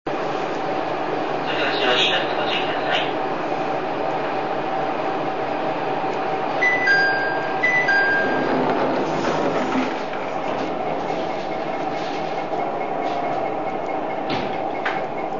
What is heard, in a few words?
kantetsu 2100, train motor sound